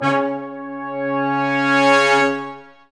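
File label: Sections, Brass Large Ensemble sfz; Roland LCDP06 Brass Sections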